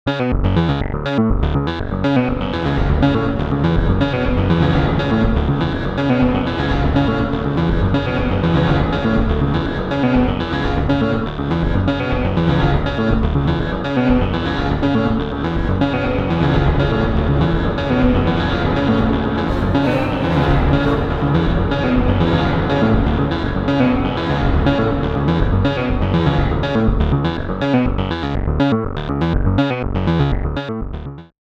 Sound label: Reverb